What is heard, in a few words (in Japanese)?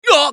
ウィザード男 被ダメージ